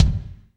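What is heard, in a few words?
bass drum, Real Kit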